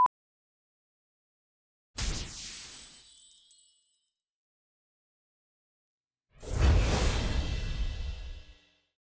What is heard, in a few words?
Check Out SFX; SuiteSpot; RnB Divas LA S2